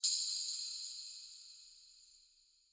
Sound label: Shimmer Hit; SoundsCrate